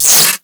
jumpercable sparks; favela; Call of Duty Modern Warfare

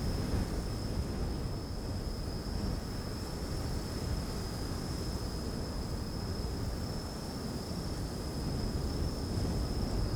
ambience field night windy dry; Rust

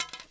Arrow Quiver; Civ4 warlords

